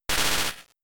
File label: Thunder Shock; Pokemon; Gen 3; attack moves; RSE